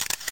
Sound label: Shutter Sound, Camera; LG GS290 Cookie Fresh